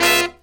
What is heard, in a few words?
C# BRASS; Hip Hop; E-MU Formula 4000 Series Vol. 1; Hip Hop Nation; 4000 Combo 3